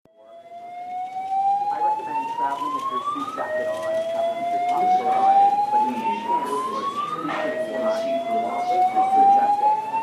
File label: airport